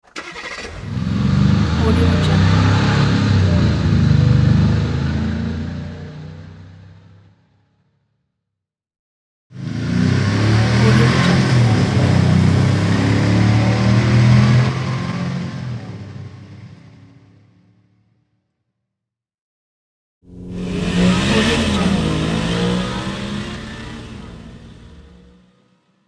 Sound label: driving car